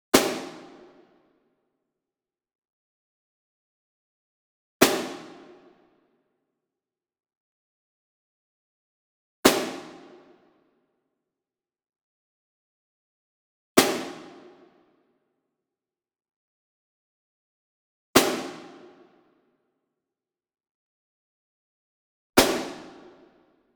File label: Single Snare Pearl Marching Hit Medium; boom library; Cinematic Strikes Construction Kit